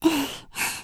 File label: laugh; Eternal Return; Tia; Voice Line; KOR